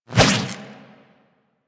Electro Prod Whoosh; Warframe; Weapons

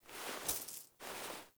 Coin Toss Foley; Level Events; Midrath

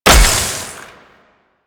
Void Fully Charged; Weapons; Warframe; Duviri; Incarnon Adapters

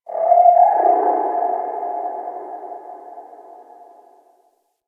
Ocean Underwater Creatures Low Medium; Warframe; Grineer Ocean

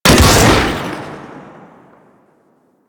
Arch Cannon Shot, Weapons; Warframe; Archwing

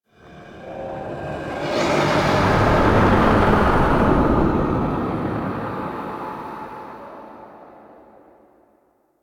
Spectral Whoosh; Warframe; Ambience; Entrati

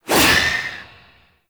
Void Heavy Scythe Alt Whoosh; Warframe; Weapons; Duviri; Incarnon Adapters; Melee

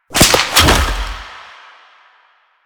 Bunraku String Drop; Warframe; Koumei

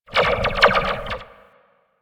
Zipline Random Slow; Gadgets; Parkour; Warframe

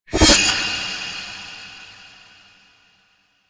Eye Flare; Ballas; Warframe; Sacrifice; Sacrifice Shrine Intro

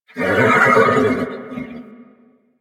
Horse Vocal Mount Neigh; Vocals; Warframe; Vehicles; Duviri

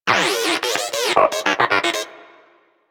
Moa Inquisitive Vocal Battle Ends; Warframe

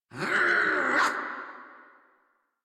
Attack Effort, Battle; en; Warframe; Grineer; Catbrow Handler

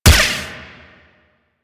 Rifle Lancer Gun Shot; Warframe; Narmer

